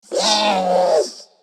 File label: Repair Drone Idle Angry; Necramech; Battle Barks